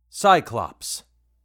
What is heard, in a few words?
cyclops; bestiary; 5etools